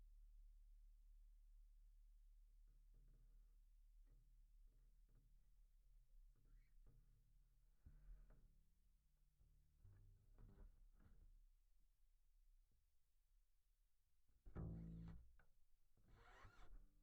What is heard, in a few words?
Bass DI